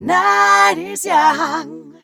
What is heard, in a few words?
f mel e flat, fem Vocals